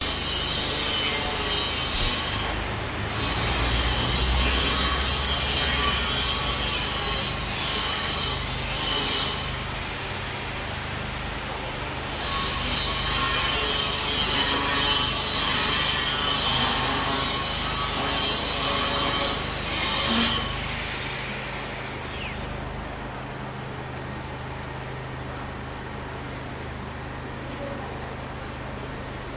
Construction Site Angle Grinder Tool Sound Metall Falling Down Walla In Dist; Budapest Hungary; your free sounds